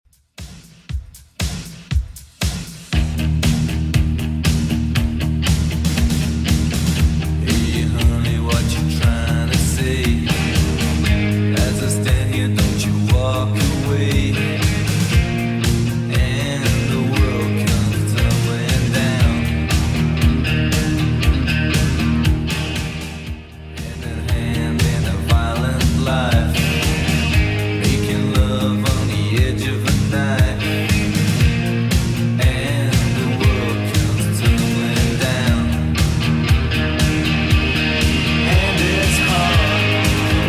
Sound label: 80s Redrum 118 bpm CLEAN